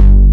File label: FUNKY LD; Pro-Rec - Synthsonic; BASSES